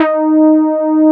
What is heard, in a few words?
SB HORN; Wizoo - Powered Nord; STEREO LAYER